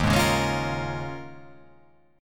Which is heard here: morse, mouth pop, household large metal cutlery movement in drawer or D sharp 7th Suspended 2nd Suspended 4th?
D sharp 7th Suspended 2nd Suspended 4th